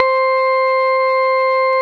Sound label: SYN SYNTH, SYN Analog; Sound & Vision; Gigapack I CD 2